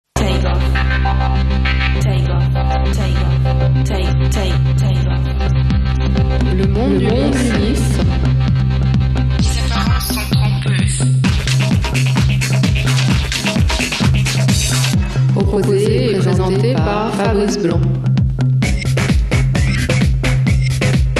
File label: jingle